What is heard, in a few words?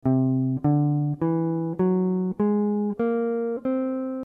wholetone